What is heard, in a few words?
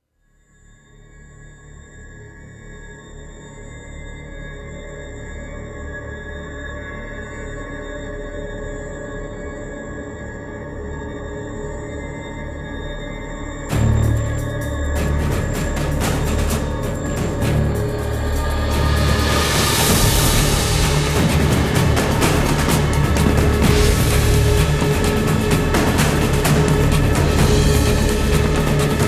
Florence Escape; assassins creed ii